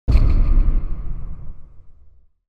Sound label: DOOR METAL